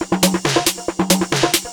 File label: drm 138, Techno, drum-loops; Techno ID